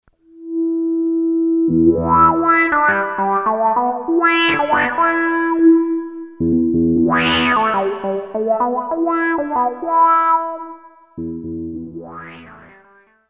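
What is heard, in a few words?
gtr wah, guitar wah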